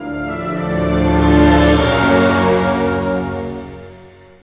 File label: Boss Victory; Night at the Museum 2 the video game 2009; nds